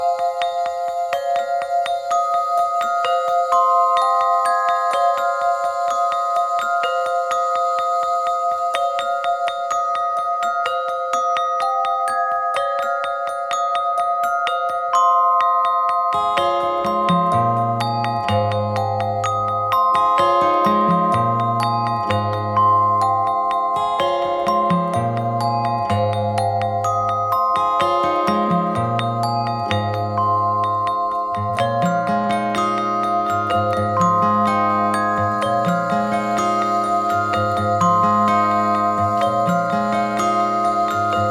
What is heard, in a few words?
Glockenspiel, Ebows & Guitar, Glockenspiel